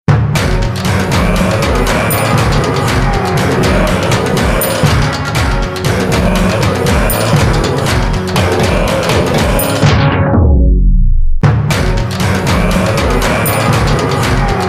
Jingle, boss intro; asterix obelix xxl 2 mission wifix 2006 playstation portable gamerip

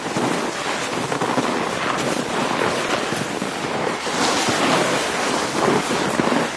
Vehicles Generic

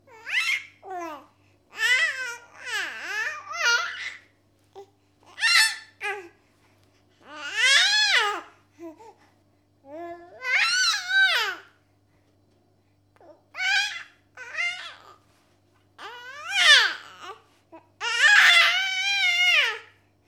happy babbling baby

